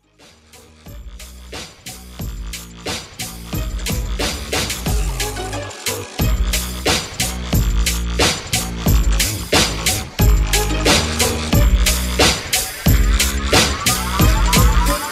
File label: Hip Hop Dubstep 90 BPM